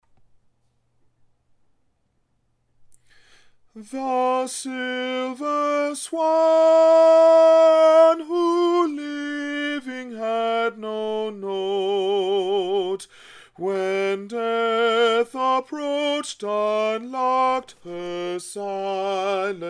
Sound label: Swan - Sop 1